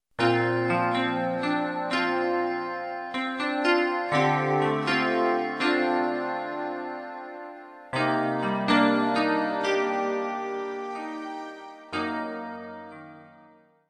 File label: elect pop